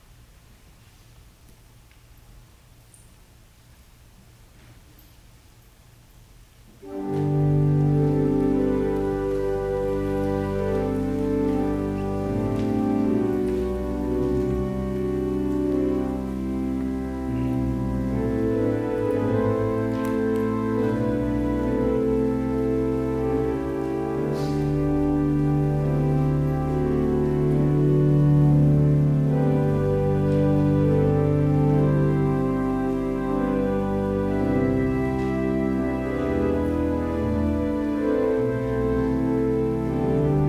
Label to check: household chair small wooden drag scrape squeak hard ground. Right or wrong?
Wrong